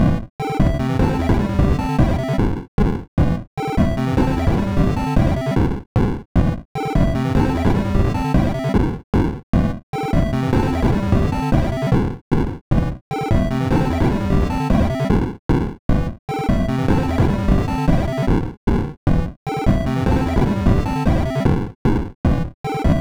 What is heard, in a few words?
beep box